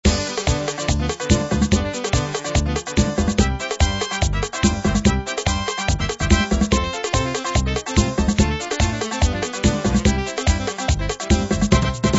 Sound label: merengue